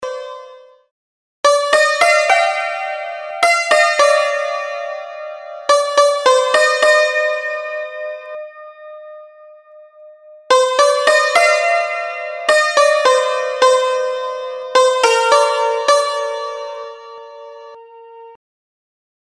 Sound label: santoor